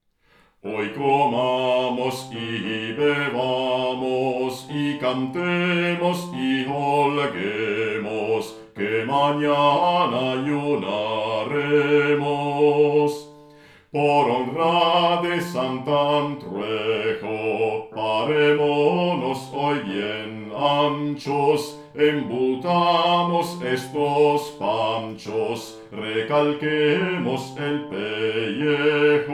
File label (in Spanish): Bassi; HOY COMAMOS Y BEBAMOS